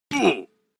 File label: damage sound; minecraft alpha